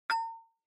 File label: Onoma Ding High Short